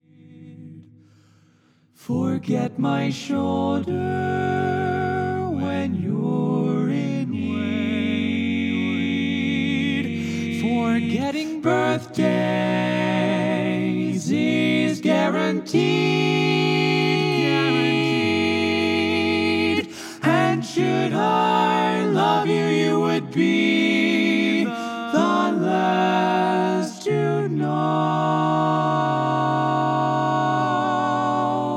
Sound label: Tenor